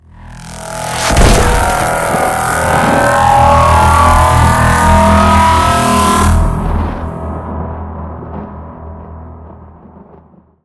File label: Death Ray